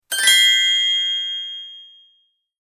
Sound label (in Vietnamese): Am thanh an tien xu trong game